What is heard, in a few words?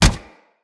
Run Metal, Footsteps; Kubrow